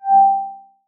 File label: bar update